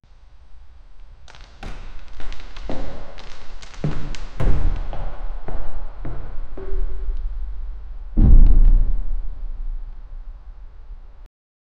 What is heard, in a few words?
creaking wooden door in a; cathedral